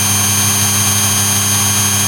saw sawing; weapons; Sourceforts2.0

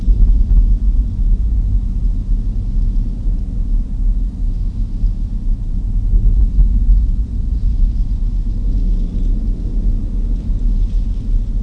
wasteland wind

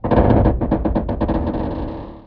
rusty pipes

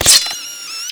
roller mine blades out; hl2

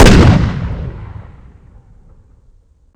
shotgun fire; weapons

